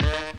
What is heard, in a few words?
brass, Stabs; monkey